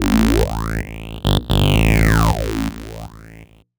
Techno Synth

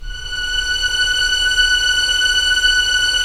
STR, Roland LA Composer

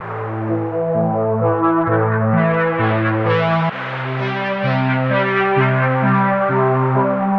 130 A Seq DownUp; Zero-G; Future Wave

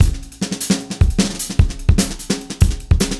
Acoustic Kit, Drums & Percussion

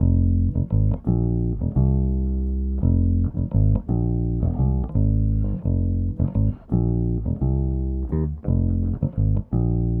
Bass, 70s Analog Funk

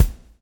swing

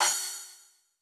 Roland U220 Electric Set, Drum Machines

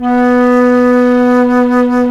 FLT C FLT, C Flt Brt

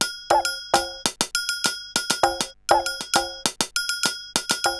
RX17 Perc (100BPM), cyborg beats; musicradar

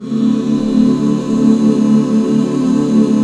D 7, back chords; Sound & Vision - Gigapack II CD 1

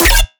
bass hit hiccup D; Virtual Riot

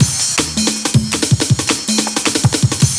Reflections Break, breaks

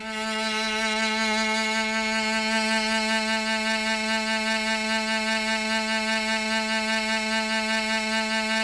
CLN CLO B-3, CHAMB CELLO; Sonic Reality; Vintage Timetraveler

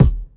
ALA BD; ALAKIT; USB Soundscan vol.31; Miro s Grooves; AKAI

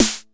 909 SD, TR-909; Best Service Dance Mega House Dance

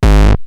Roland TR-909 BD; BD Selection

